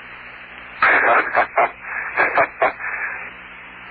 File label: hahaha